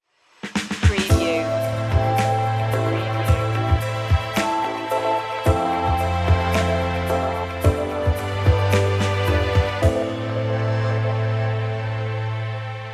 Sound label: ethereal